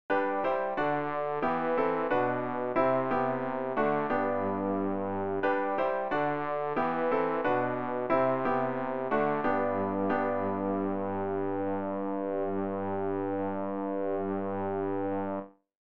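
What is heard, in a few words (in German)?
bass, Übehilfen; Ref. Gesangbuch; RG 194: Kyrie, kyrie eleison (Taizé)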